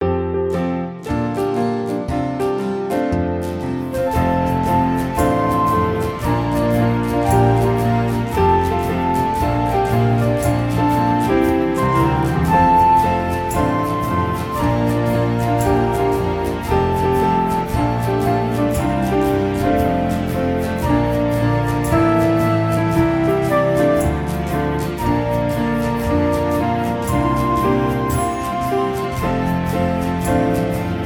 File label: Instrumental